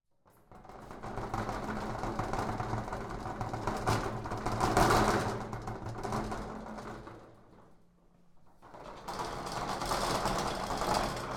METAL RATTLING; Resonance Sound Design; ASSORTED FOLEY ELEMENTS

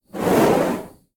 torch whoosh; Soundrangers

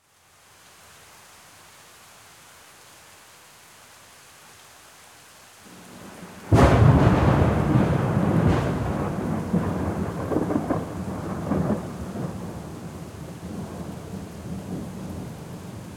Thunder Boom; Soundopolis; Natures Fury

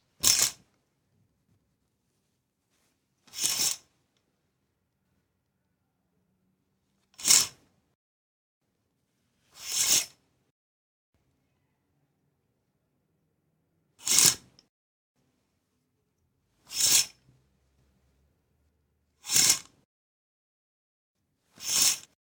Curtains; TheWorkRoom Audio Post; Curtains & Blinds

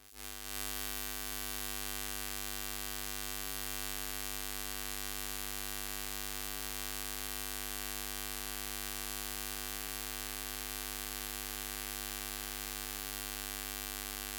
Lamps, halogen light bulb; aXLsound; elektrousi

